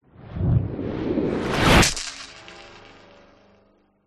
sound design whoosh fast dark wet stones synth growl tail